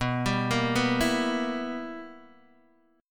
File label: B Major 7th Suspended 4th Sharp 5th, Guitar Open D chord